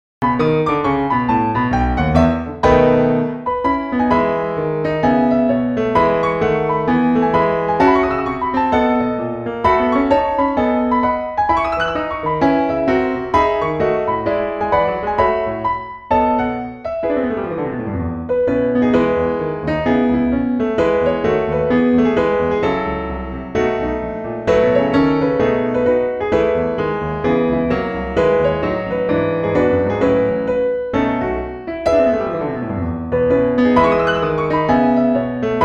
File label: Piano